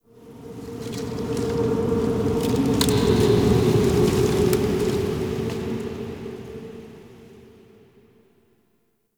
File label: Zariman Park Wind; Warframe; The New War; Ambience